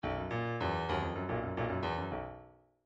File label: midi file grooves funk